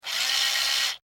Scifi Servo Mechanical Robot Small Motor Toy

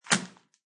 Plastic wrapper crinkles on counter; Crackling; Objects

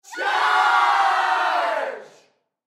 Human Crowd Studio; Crowd Reactions Charge Loud; Blastwave

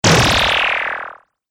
Heavy Plasma Rifle Blast; Quake

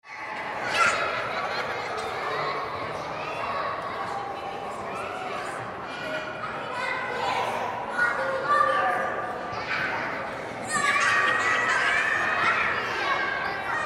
Playground Indoor Kids Fast Food Restaurant; Ambience; Playgrounds; Blastwave

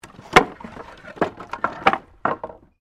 Wood Movement Medium

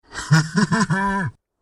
Cupboard Monster Laughs, monster sound effect; Monsterous Sound Effect; Andrew Fleming

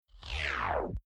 Zipper Slow Down Pitch Down; Sound Design; Zap; Tom Hutchings

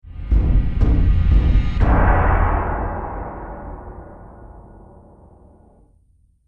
Fantasy Impact Trailer, stinger

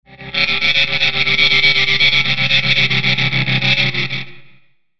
Chopper Swell Bright Filter; Blastwave; Chopper Sound Effects

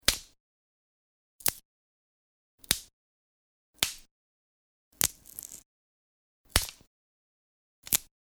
Twig Snaps, Snapping and breaking twigs; Snaps (Sound Effects:Objects)

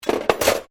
cutlery general place drawer, Household cutlery; Kitchen

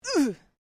FEMALE GRUNT

